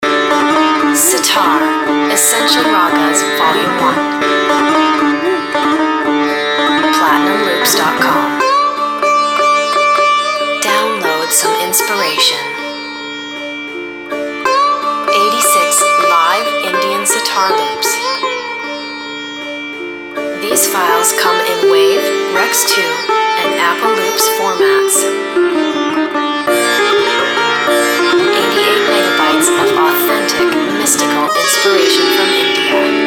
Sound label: sitar